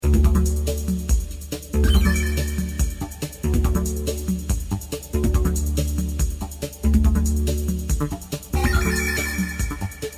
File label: ringtone